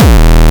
kick

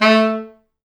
A2, Pop Brass; Best Service ProSamples vol.19; AKAI

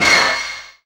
FX Dance; Sound & Vision - Gigapack II

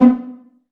STR PIZZ F#1 MF; Sound On Sound - Peter Siedleczek's Orchestra

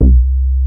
Sub Sonic Bass C2

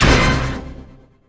STAB

